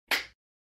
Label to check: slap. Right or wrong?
Right